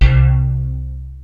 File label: SYN CHOP, D - 70; Rubber Chicken Software Sample Bank